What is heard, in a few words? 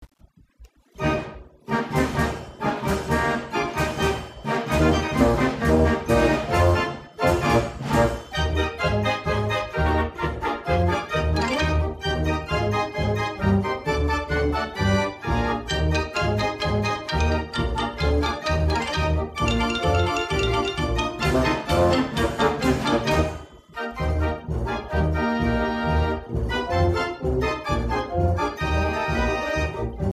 band organ, belgian